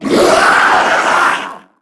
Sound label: Death; Warframe; Corpus Amalgam Sniper; Dialog Barks Battle